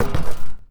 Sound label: Street Ball Acrylic Basket; SFX Kit